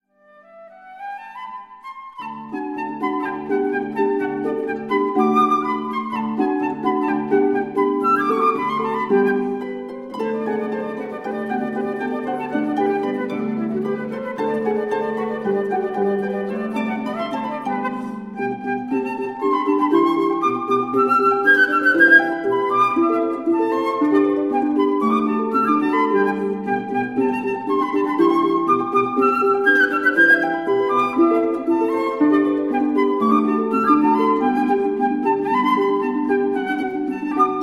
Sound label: Duo G. Donizetti Sonata g Allegro